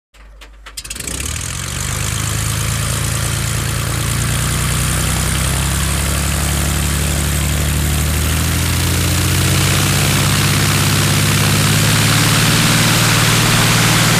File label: Prop Plane Start Taxi, cessna